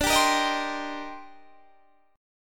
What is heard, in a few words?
Eb Major 13th D, guitar